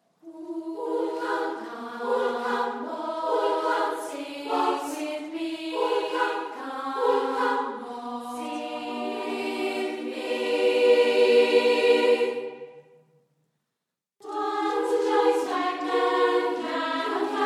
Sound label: acap; Waltzing Matilda